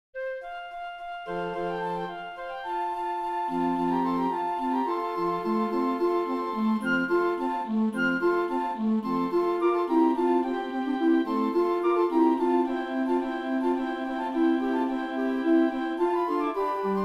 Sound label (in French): La réjouissance Haendel ensemble de flûtes